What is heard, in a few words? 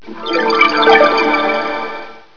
Chime